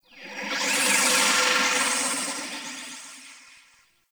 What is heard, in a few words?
Ship Market Data Blips; Warframe; Corpus Ship Remaster